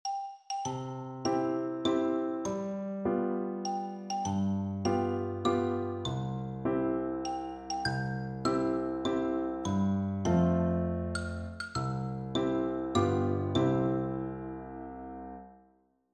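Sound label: happy birthday xylophone, percussion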